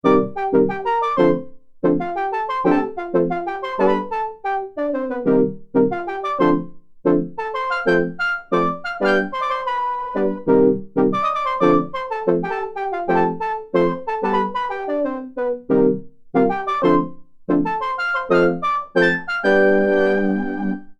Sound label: Fabric Soft; TEO-5